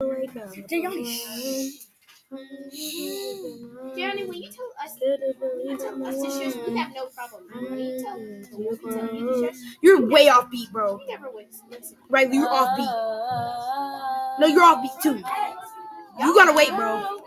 singing

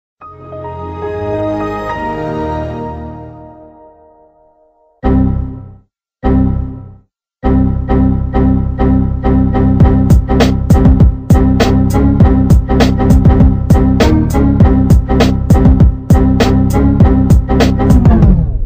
windows error song

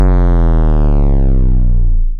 distorted bass drop

